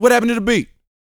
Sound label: VOX, R&B Dance, HIP-HOP; Spectrasonics Vocal Planet